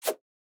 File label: Dash; Boomerang Fu